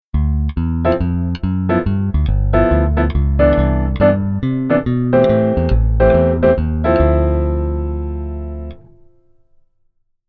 keyboard rhythm

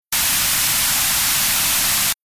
Synth Filter Band stop

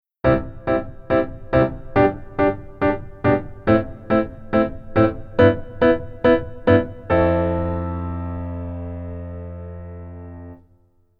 Keyboard Rhythm Basic

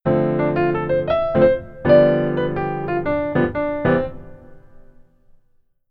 keyboard solo right hand

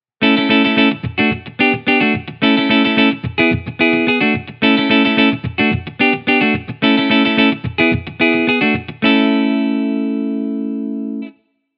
guitar Cutting

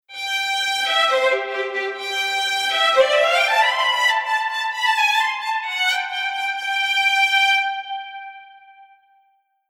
Strings Dur Interval

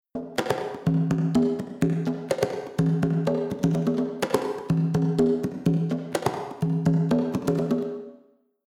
Reverb After

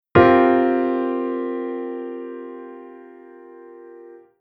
Chord 7sus4

